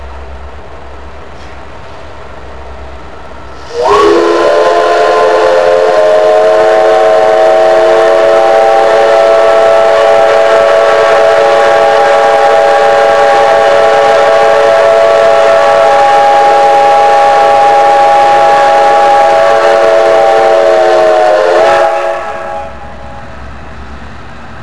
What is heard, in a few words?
5 O'clock whistle